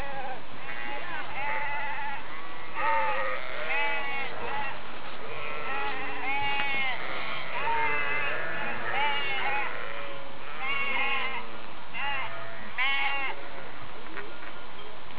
sheep; Italy; Dolomites; tofane

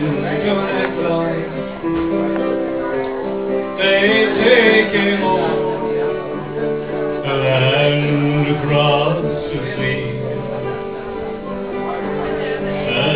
pub musicians; Ireland; Connemara; Clifden

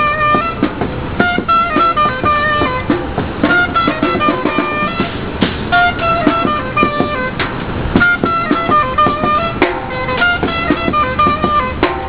clarinet n drums; United States; Illinois

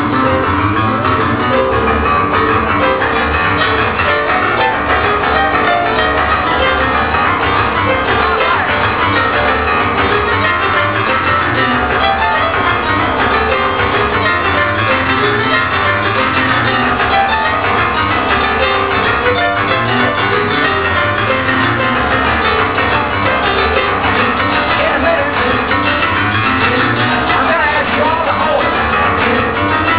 blues festival crowd; Chicago; Illinois; United States

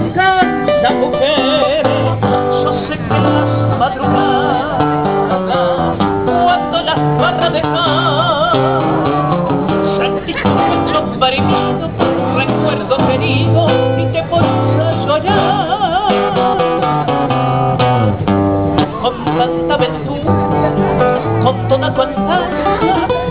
tango singer; Argentina; BuenosAires; LaBoca; TangoDancers

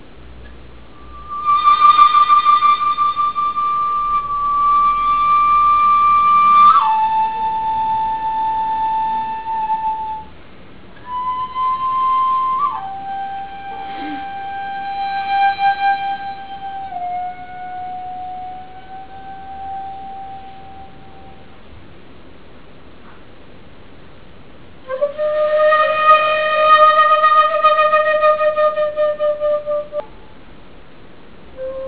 flute player, Japan; People; Asia

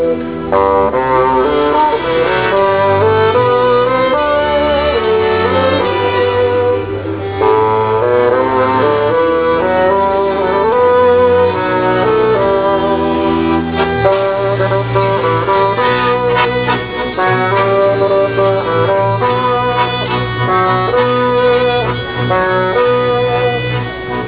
funfair orchestra; Prague

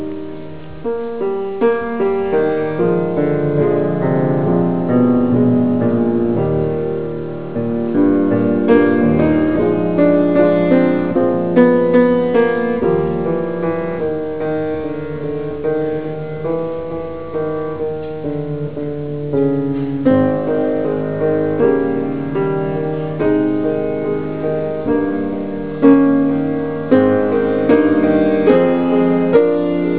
piano

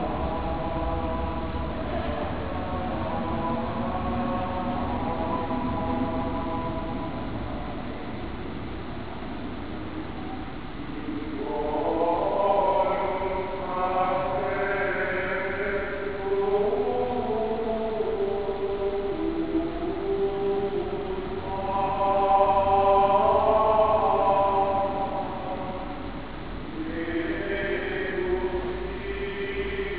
metropolitan cathedral; Argentina; BuenosAires

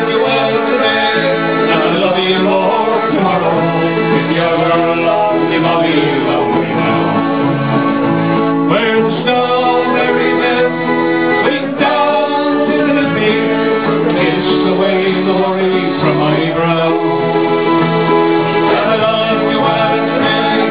pub musicians; Ireland; Connemara; Clifden

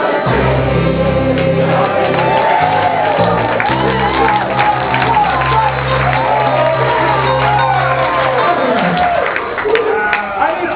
full audience; San Francisco; People; California; BATS

